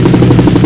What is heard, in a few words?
helicopter, transport; Phase 4 - Desktop Video Dreams